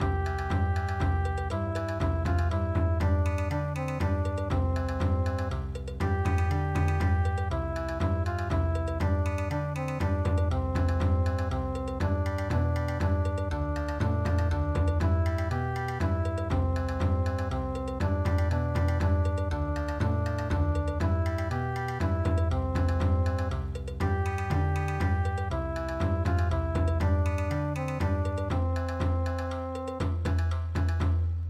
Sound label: Dance Bon Odori; midi; traditional